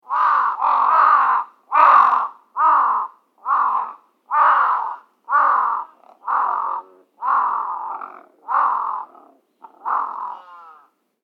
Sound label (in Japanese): カラスに囲まれる